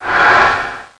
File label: TURN